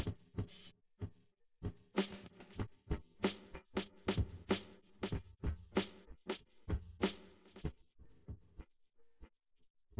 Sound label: drums